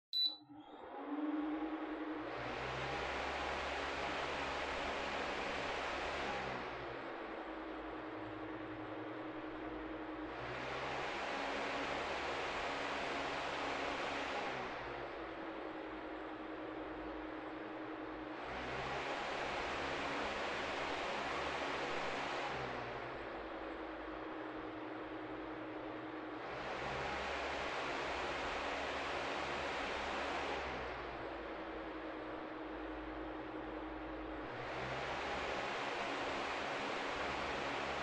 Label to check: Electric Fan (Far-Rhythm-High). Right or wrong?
Right